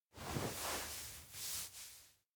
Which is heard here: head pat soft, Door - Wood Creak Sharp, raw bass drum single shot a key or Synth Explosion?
head pat soft